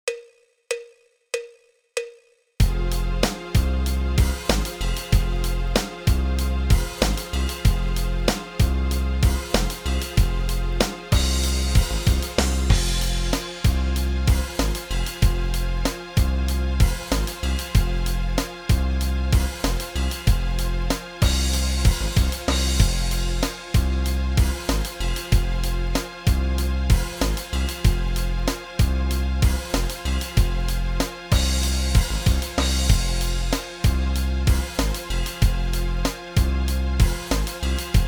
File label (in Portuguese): Fa menor, guitarra Triades Menores